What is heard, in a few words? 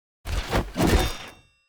Cutscene Intro; bloom minigame